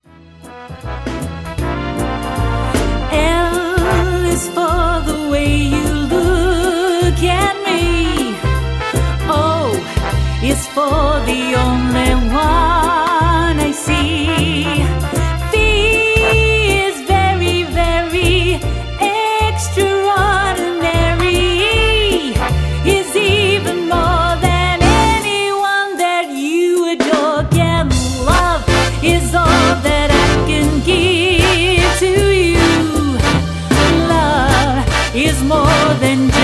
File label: The Vintage Voice